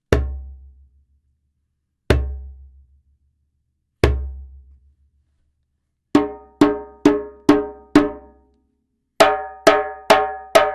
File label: djembe